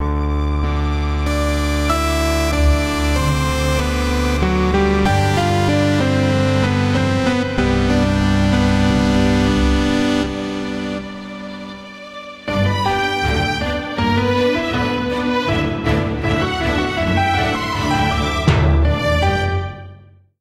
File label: cinematic stage clear